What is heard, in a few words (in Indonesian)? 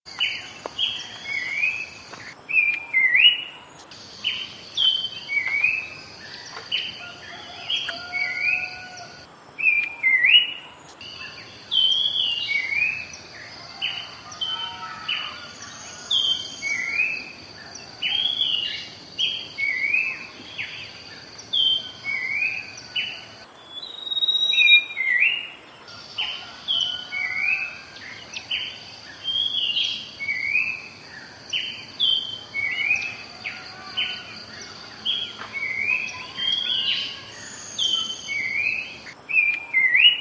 kicauan pelanduk semak